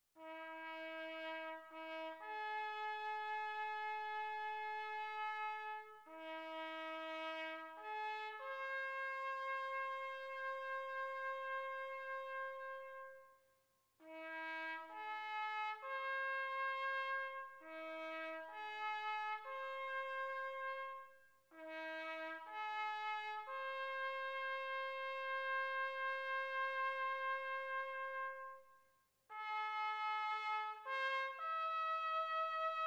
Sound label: Taps; Sounds of CGA